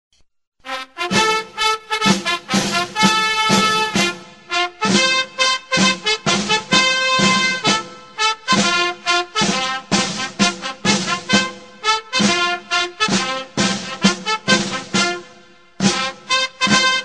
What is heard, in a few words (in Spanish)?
Toque Bandera, TOQUES MILITARES; MATERIAL CÍVICO